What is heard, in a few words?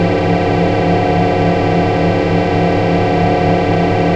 forcefield; Quake 4 Alpha